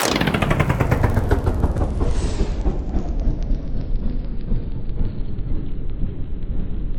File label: Slowdown Engine